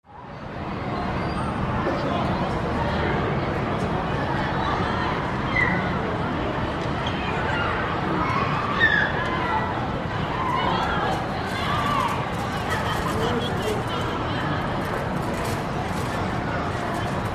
School Playground: Kids Playing Outdoors, With Screaming And Light City Traffic.